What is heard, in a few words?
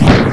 STOMP; Beavis and Butt-head in Virtual Stupidity